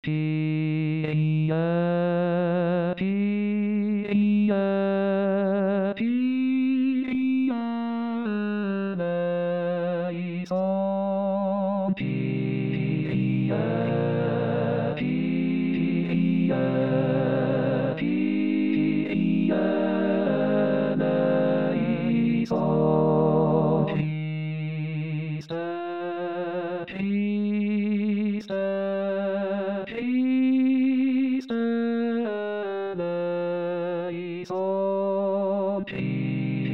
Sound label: Kyrie Eleison Coro